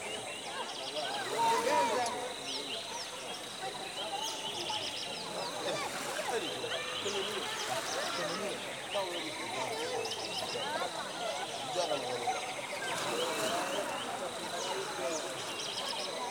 Village Happy Loop; skykeepers; ps4 windows xbox one gamerip 2017

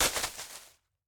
foliage hit; teardown; ps5 windows xbox series xs

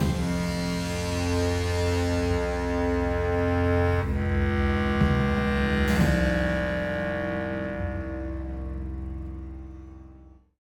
String; assassin s creed unity dead kings

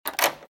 door; Cookie Jam

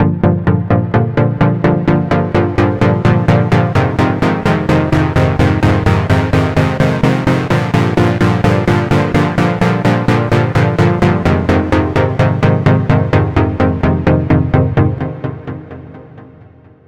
Synth Main

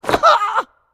Character Hit; Character Porky Hit

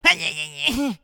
Minion Attack failed

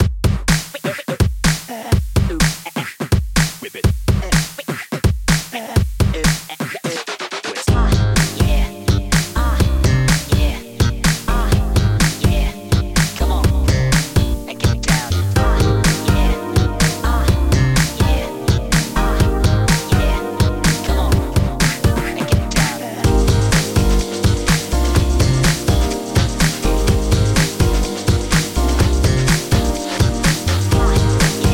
Fresh (Instrumental); Friday Night Funkin'

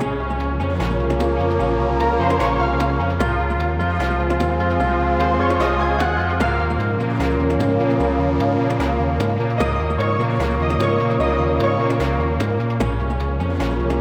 Loop 150bpm; jenny leclue detectiv

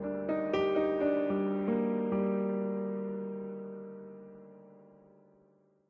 Death Stinger

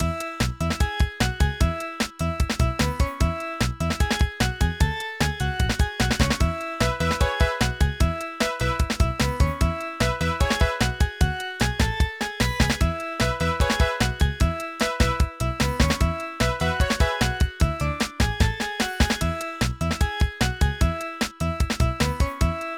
Title Jingle; Imagine Babyz Fashion; NDS

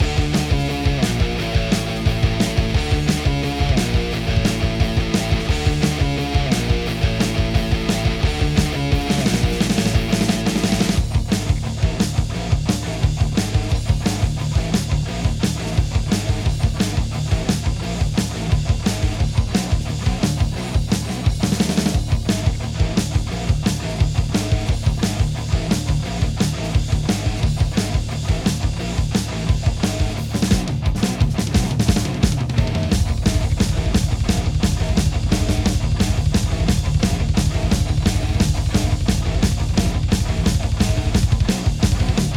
Metal Intense; Bogheim

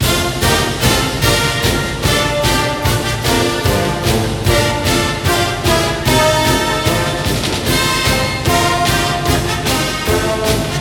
Broadcast Fight Song

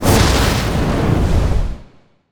Attack Fire Special

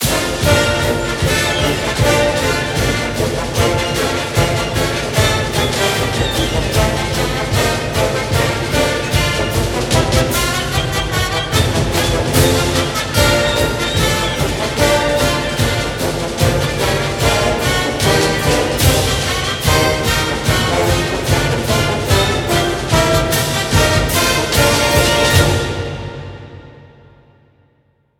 Broadcast Outro

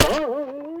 Target Frog Boing Bad; Levels Hub Bayou 05 Tag Keepaway

